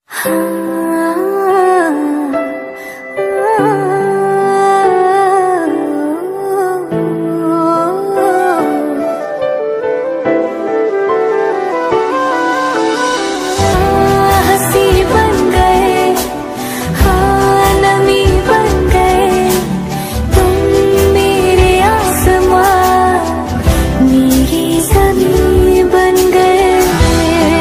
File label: sad ringtone; breakup